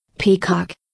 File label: peacock; wild birds